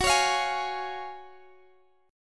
Gb Major 7th Suspended 2nd, down, Guitar, Standard; strummed; JGuitar